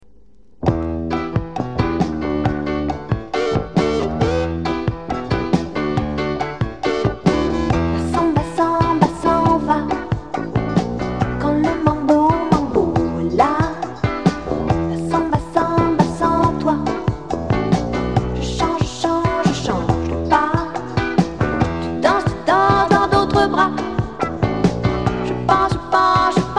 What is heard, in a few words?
Samba Mambo